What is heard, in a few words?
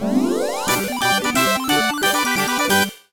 (Jingle) Set Complete!